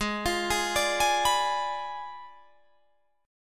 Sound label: Ab Diminished 7th, down, Guitar, Drop D; arpeggiated; Abdim7 Chord